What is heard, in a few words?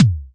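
Pearl SC-40 bass drum; DRUM MACHINES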